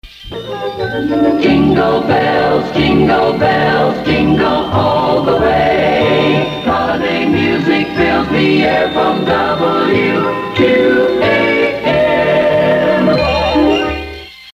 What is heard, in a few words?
Holiday Jingle Bells; WQAM